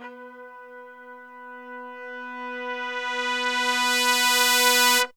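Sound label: brass crescendo; Zero-G; Phantom Horns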